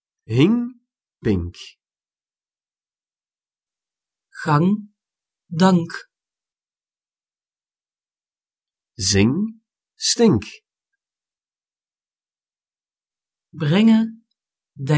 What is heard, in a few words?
ring